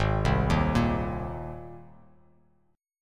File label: Ab Major Add 9th Ab; Abadd9 arpeggiated; JGuitar